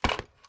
ui drop plastic junk; Rust

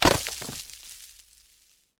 connect stone

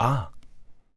vce idle ah

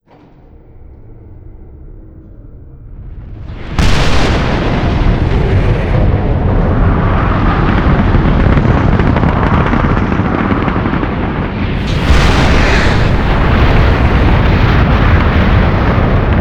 icbm launch stereo; Call of Duty Modern Warfare

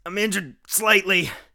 hurt light; Escape from Tarkov; usec2

